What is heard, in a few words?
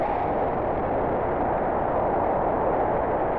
Jump Loop; Celerity